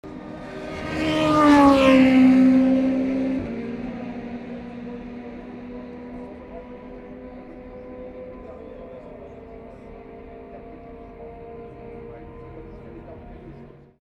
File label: Car in Tertre Rouge Saturday afternoon